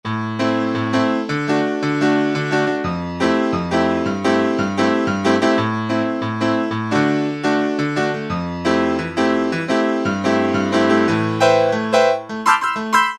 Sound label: Brt Piano, Roland U-110; Factory Patch